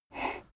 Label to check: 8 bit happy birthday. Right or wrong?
Wrong